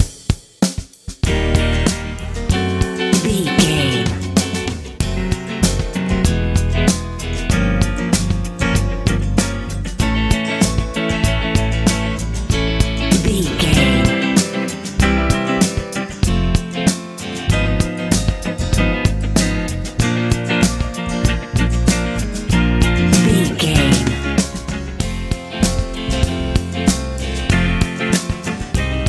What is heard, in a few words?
powerful hot big wind sprint pop